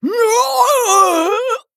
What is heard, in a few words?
Female Tortollan Death